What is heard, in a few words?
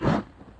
Flame Charge; the-purple-night